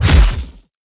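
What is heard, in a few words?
spear stab, impact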